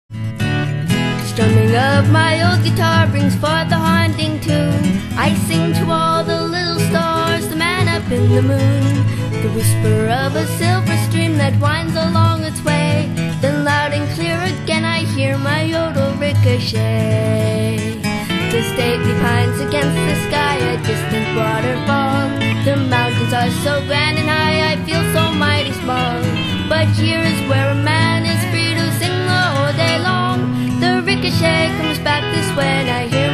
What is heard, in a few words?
Ricochet Yodel